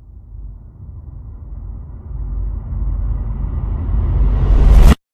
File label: Boom Reverse